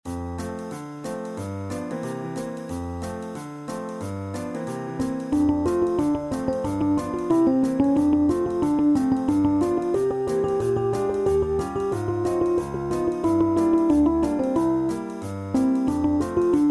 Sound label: piano, midi